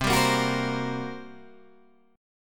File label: G Minor Major 7th Double Flat 5th, C